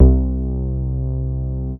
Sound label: C3, BASS; Q Up Arts; Dream Experience; BASS BANK 7